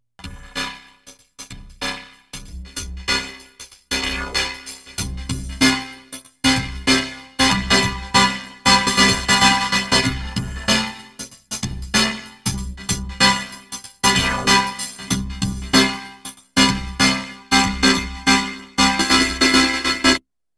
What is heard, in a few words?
vocoder, synth access virus